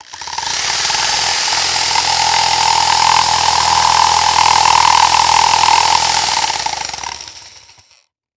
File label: Industry Tool Drill Power Drill Drilling Into Wood